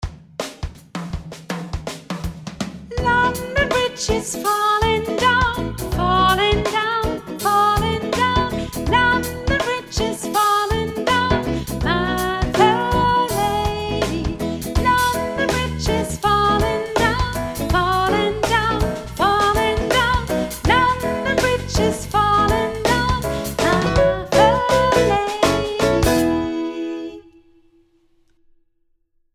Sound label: London Bridge; Volkslieder